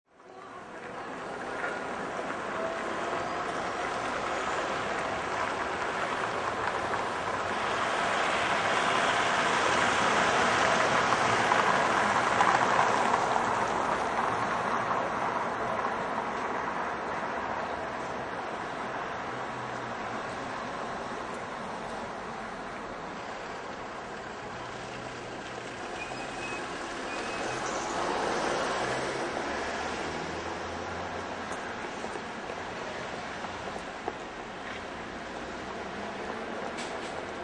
City Ambience Street Corner Occasional Tram, Cars and buses dri; Cars and buses driving by in various directions, people walking by; Lynne Music